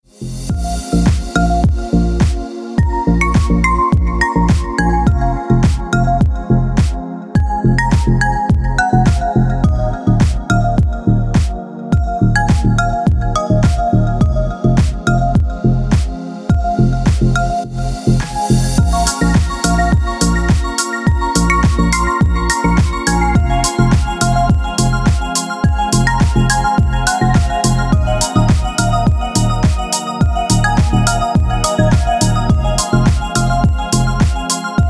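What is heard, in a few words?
space electronic alarm clock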